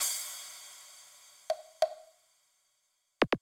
Beat Crash & Perc, 140bpm; Zero-G Sound Sense Grime Scene; GS Construction Kits; Its Primetime; 90 s Sample CDs

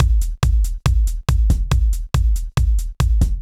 Kick Loop, 140bpm

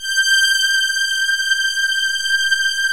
MIDI STRINGS

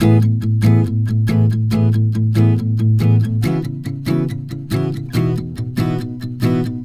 Acoustic Pop Guitar 140BPM C#min; Acoustic Pop Guitars; Vengeance - Essential Clubsounds Vol.4; Guitarloops

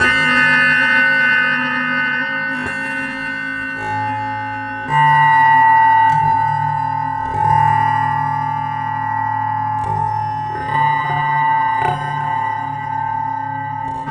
Autoharp Drone; Sonic Foundry (Sony Creative Software); Loop Noir

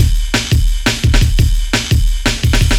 Break Slap 172, breakcore breaks

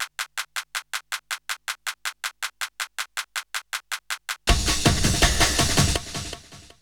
Essential beats

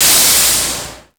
Fairlight CMI III Noise Hit

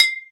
Bottles, Alesis HR16B

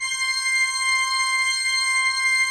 C6, Cathedral Organ; Propeller Island

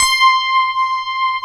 FM ELP C5 R, Keyboards Old School; USB Soundscan vol.09 - Keyboards Old School; AKAI

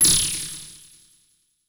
CABL TEN, CABL TENS; Total Control - Action Cutz

